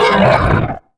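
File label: droid war attack; Star Wars Knights Of The Old Republic I; XBOX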